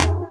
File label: tom, dance drums